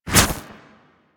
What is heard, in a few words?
Drill Flame Burst; Warframe; Grineer Ghouls; Ghoul Drill Sergeant; Attacks